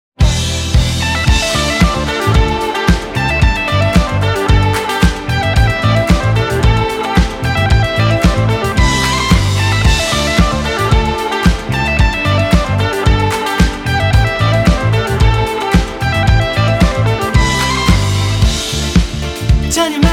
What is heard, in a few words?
Rock Pop Band; Oxfordshire